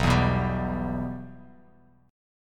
B Suspended 4th Sharp 5th B Bass Standard; Bsus4#5 strummed; JGuitar

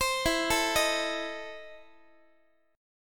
E Major 7th Suspended 4th Sharp 5th, Guitar

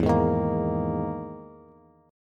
E-Major Sharp 11th E, Bass Standard; EM#11 strummed; 12,11,13,15; JGuitar

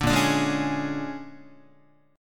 Bb 7th Sharp 9th Bb, Guitar

